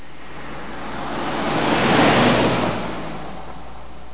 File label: car pass by